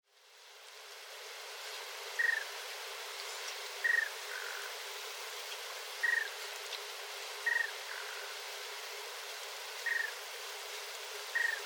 shimakuina; tsurui

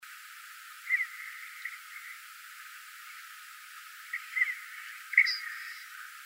umiaisa, tatsuno; ウミアイサ; 求愛; 兵庫県たつの

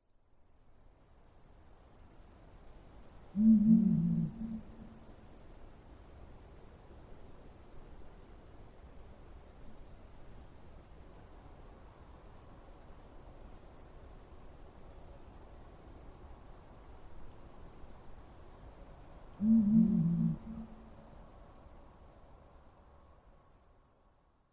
shimafukuro, nemuro

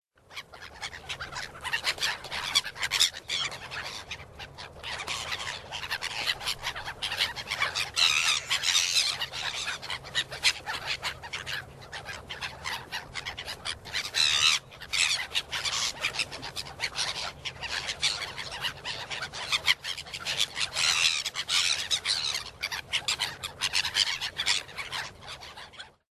yurikamome; hyogo

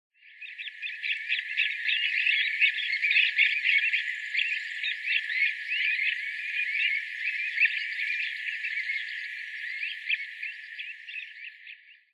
tonen, hyogo

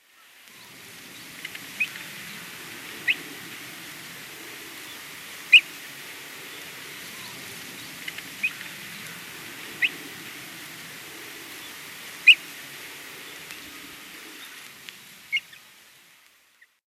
sorisei; makabe

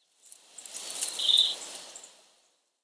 uzura, sakata

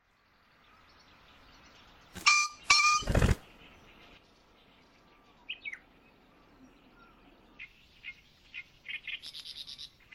kizi, watarase, koe

